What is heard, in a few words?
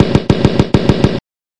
50 Caliber Machine Gun